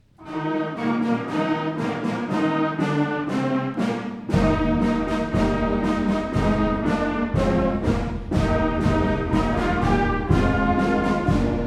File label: regal march, 6th grade band concert; tfms band concert